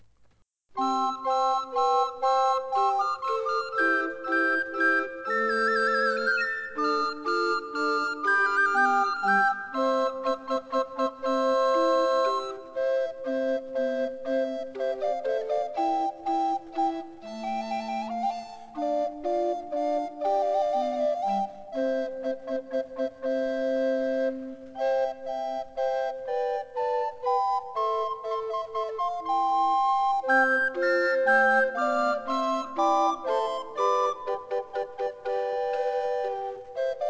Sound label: coro, flute baroque